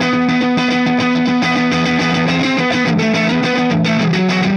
Clinical Distorted Funk Guitar

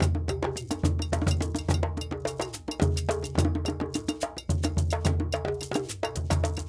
Djoun, World Percussion; Djembe, Donno, Bell, Shaker

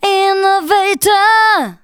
VOCALS SUNG; Techno Trance Essentials

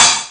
Cymbal, Amiga Soundtracker; ST-XX Amiga Soundtracker Sample Packs

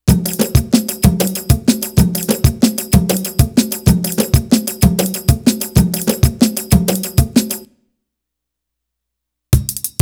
Arabic Rhythms

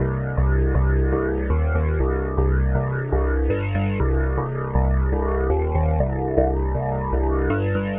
Hassh Bass, Bass Foundations; Sonic Foundry (Sony Creative Software); The Electronic Music Manuscript Collection

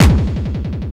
hard bass drumz

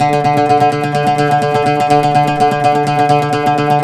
PLK OUD, Roland LA Composer; Roland - LA Composer vol. 3

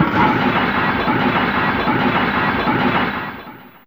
Particle, Fairlight CMI IIe; Effects3

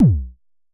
Unce Unce Kick B1, Vermona Kick Lancet; LookIMadeAThing Kicks

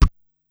"Pehh", Korg Wavestation-SR; Wave 455 - Drum Kit

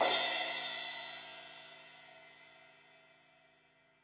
Crash 16in edge, Cymbals; Deeply Sampled Drums

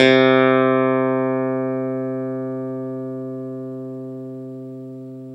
CLAVINET C3; Big Fish Audio - First Call Vintage Keyboards

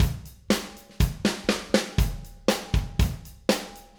Acoustic Live Drum; 90 s Sample CDs; Platinum Audiolab

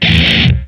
gtr ripper; BERZARRESOUNDZ

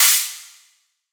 drum hat fresh splash; Virtual Riot; Drum Hits

